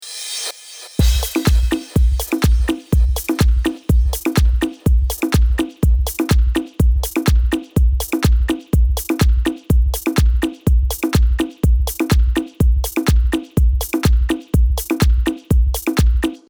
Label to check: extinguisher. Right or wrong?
Wrong